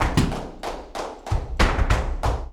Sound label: Flamenco, Tapping, Tango Con Baston, 188